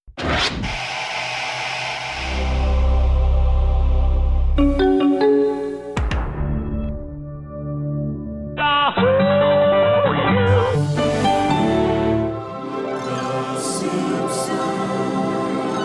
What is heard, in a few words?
Sonic Logo Montage